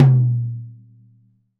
RAW TOM, Drums Acoustic; AKAI; 90s sample CD; USB Soundscan vol.10; RAW KIT 5

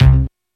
RAVE CITY; Rubber Chicken Software Sample Bank

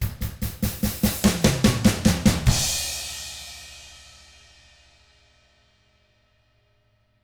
ROCK 6-8, Drums; ILIO - Double Platinum Drums 1

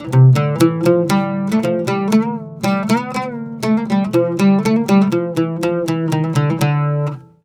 120 OUD, Mediteranean Traditions

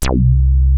WEST HARD C2, ANALOG BASS; Music Store - Claudius Diemeter Schwerlos

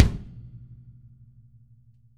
kick, Drum Kits; Sonic Reality

